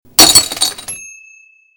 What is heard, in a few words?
Glass Breaking Medium